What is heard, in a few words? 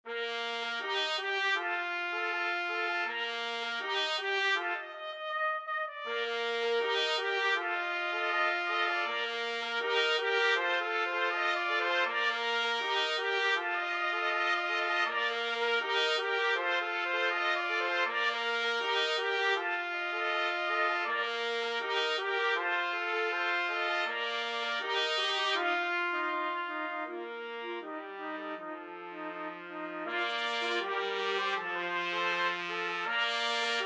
la bamba 3 trumpet